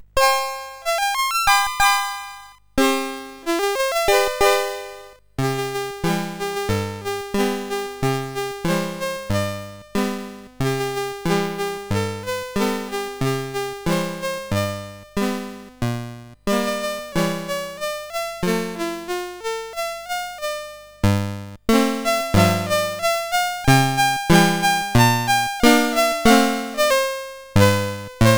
CSG 8580R5; Cheers

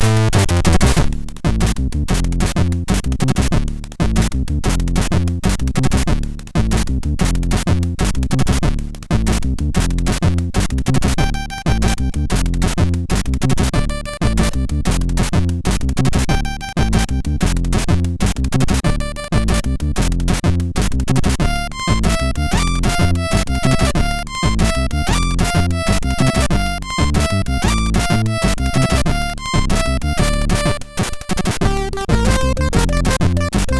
Amiga crack intro, MOS6581R4

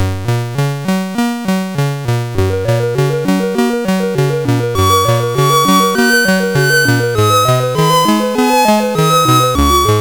Merry Xmas, CSG8580R5; sid

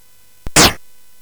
Space Gun

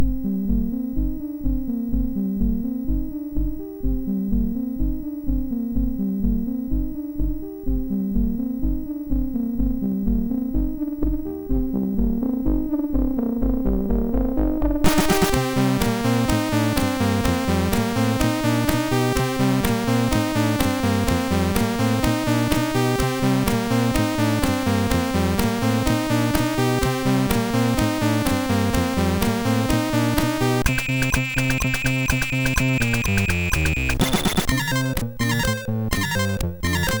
disco, sid MOS6581R2

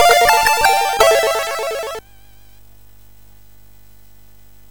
Jingles, MOS6581R4 sid

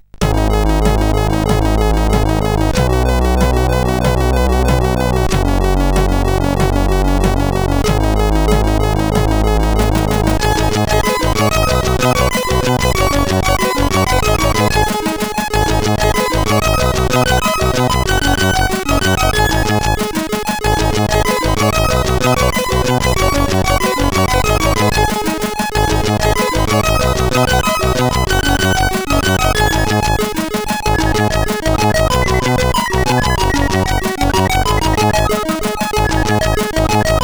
Computer Game sid CSG8580R5

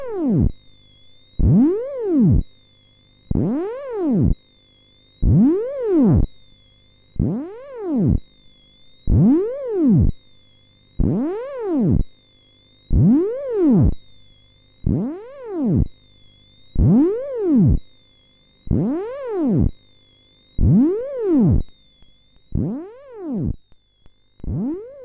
Intro, sid, MOS6581R4

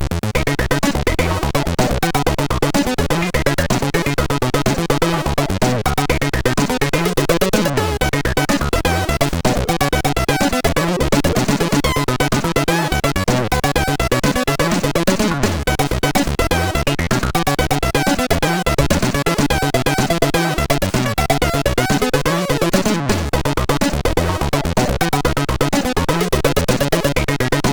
Groovy Funk, sid MOS6581R3; hvsc

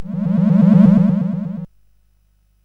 Dark Castle, CSG8580R5

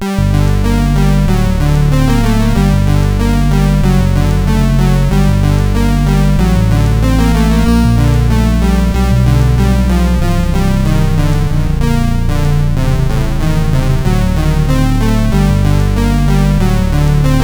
Classical, sid, CSG8580R5

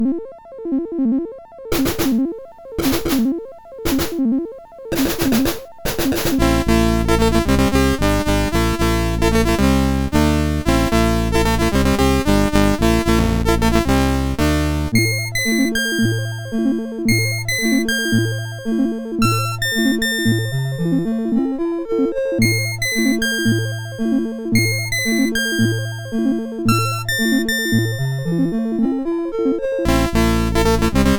sid MOS6581R4, GAMES; Accolades Comics

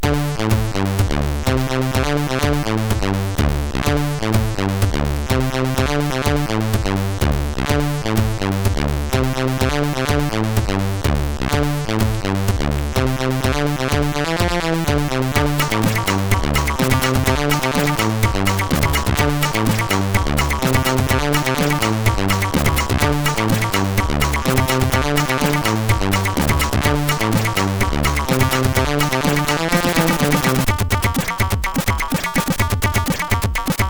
sid, MOS6581R3; Triiod 2 tune 7

